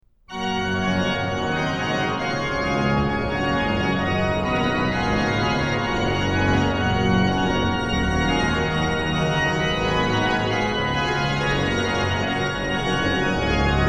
organ; BWV0643; Alle Menschen mussen sterben; BACH; BACH ORGAN WORKS; CHORALE PRELUDES

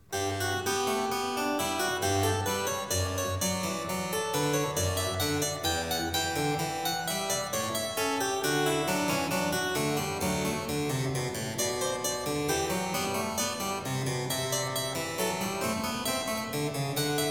Variatio 1 a 1 Clav.; BACH; GOLDBERG VARIATIONS

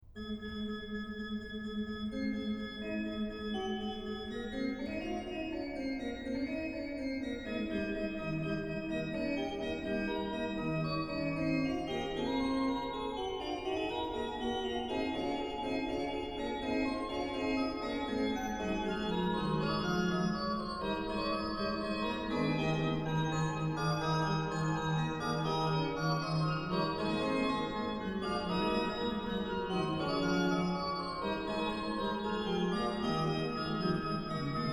Fughetta, ORGAN; Fughetta super Dies sind die heilgen zehen Gebot BWV 679; BACH; BACH ORGAN WORKS; CHORALE PRELUDES

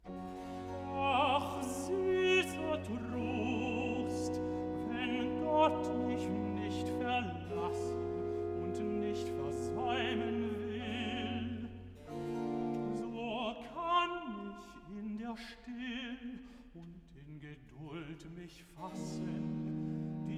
Recitativo (Tenor); BWV 138; Ach, süßer Trost; BACH CANTATAS

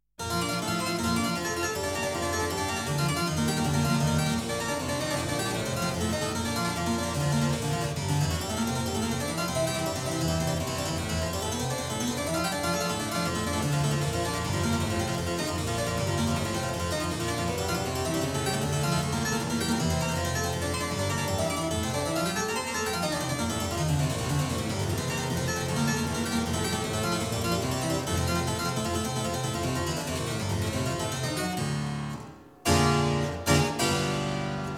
Prelude in B-flat major, clavier; Prelude & Fugue No.21; BWV 866; BACH; WELL TEMPERED CLAVIER; BOOK I

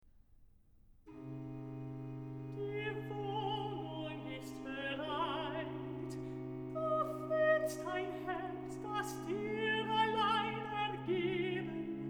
Recitative; BACH VOCAL; CANTATAS; DGG; Wer mich liebet, der wird mein Wort halten

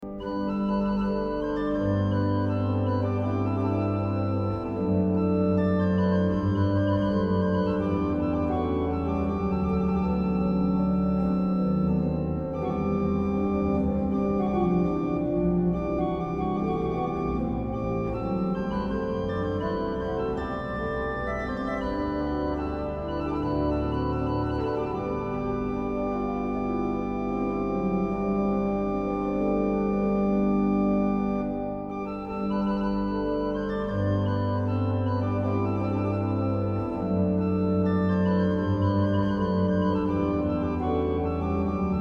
ORGAN, Chorale Preludes; Liebster Jesu, wir sind hier; BACH; BACH ORGAN WORKS

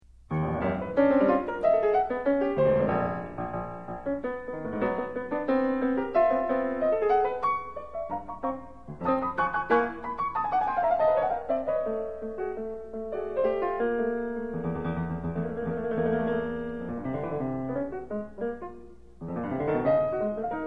Sonata for Piano - Scherzo Vivace ma non troppo

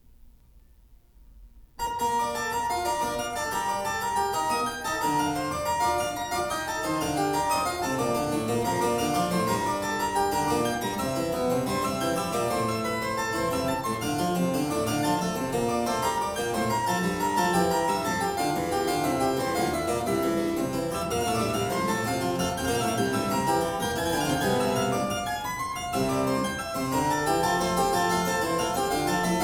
B-flat major, Corrente; Partita No.1; BWV 825; BACH; CLAVIER WORKS; PARTITAS

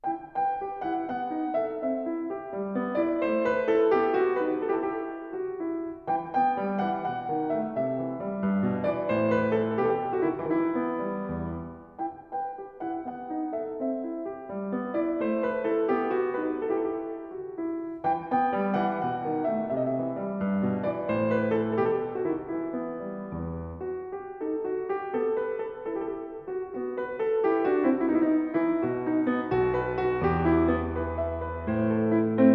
Gigue en rondeau, Keyboard Suites; Rameau

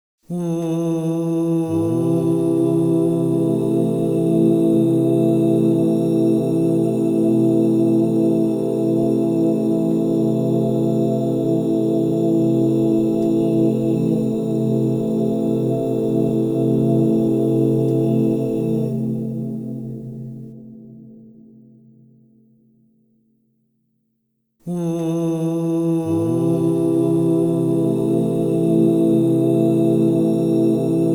La minor, crystal bowls; Yoga and sound

